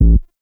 Hip Hop Pack

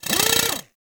Ghoul Drill Punch; Warframe; Grineer Ghouls; Ghoul Drill Sergeant

